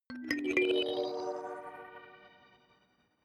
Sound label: notification kalimba magical triad up minor low